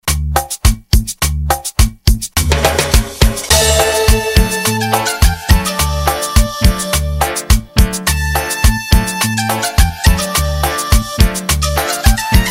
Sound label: Cumbia Extended 105 BPM